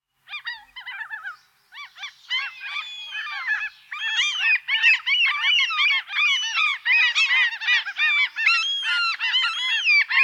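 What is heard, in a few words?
bird sounds common gull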